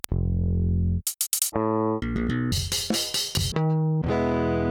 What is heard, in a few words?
Saturator; Virtual Analog